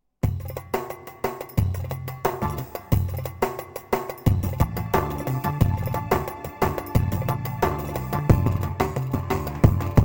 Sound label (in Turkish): tr ciftetelli 4, korg pa 500; tr karisik ritimler